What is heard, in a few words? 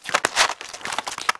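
spider step loop; Quantum Redshift; jordan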